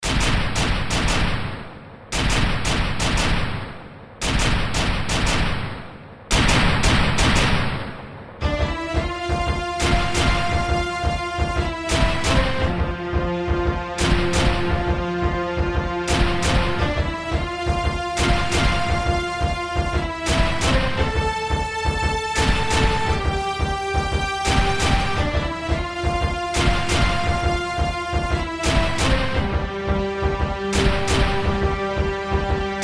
Strings Choir Brass; Terminator 2; Syntheway Strings; Magnus Choir; Aeternus Brass

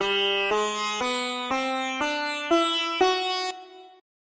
G Bhairubahar Thaat India Scale, Virtual Sitar; Syntheway

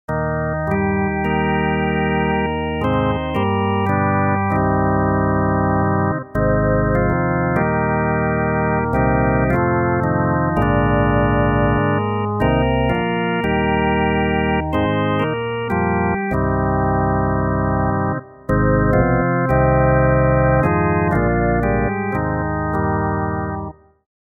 Vox Continental Combo Organ; Harmodion; Syntheway

